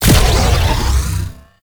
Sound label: Infested Lich Mindhack Cast; Warframe; Abilities; Zeke